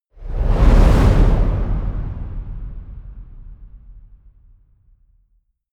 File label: wipe